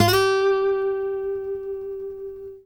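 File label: trill, Guitar; Quantum Leap; Guitar & Bass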